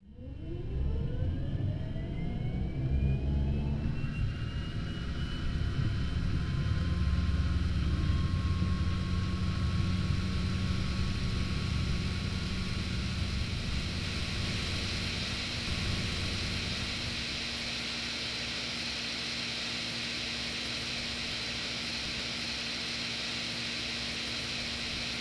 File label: apu start